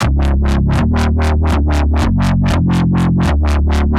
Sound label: Wobbler Bass, synth; The Forge by Hecq; Ableton; Factory Packs